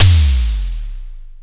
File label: Long Decay Floor, Simmons drums; SDSV Tom 4